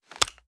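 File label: pistol reload fast end; gmod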